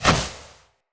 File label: Punch Whoosh; Weapons; Unarmed; Fey